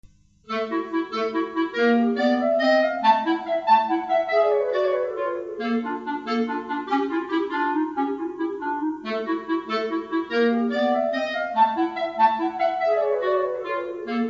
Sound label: divertimenti 2 clarinets; 5 divertimenti